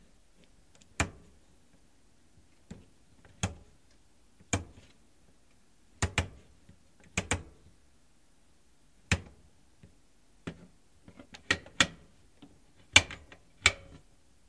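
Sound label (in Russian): звук поворота ручки стиральной машины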